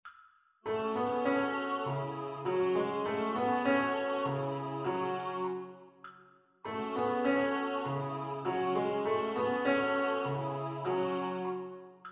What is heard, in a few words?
Tenor Basses Piano; Martin Prend Sa Serpe